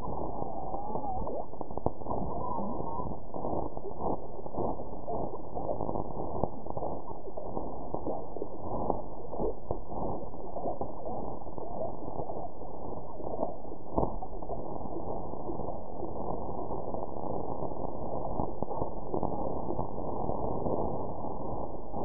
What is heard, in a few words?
Dolphin